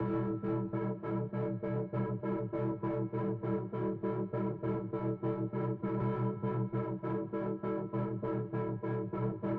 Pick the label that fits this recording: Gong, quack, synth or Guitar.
synth